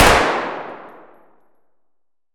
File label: saiga indoor far; Escape from Tarkov